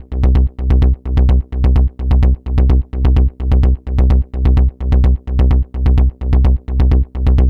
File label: Bass Loop C 128, Melodic Techno; Pace; Ghosthack; AC2024 - Day 12 - Melodic Techno